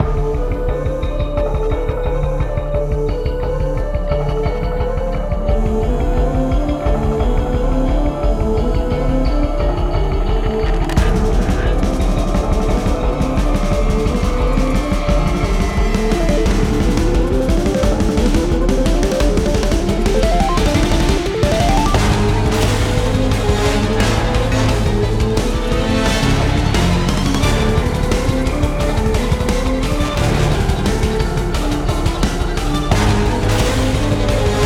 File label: BossBattle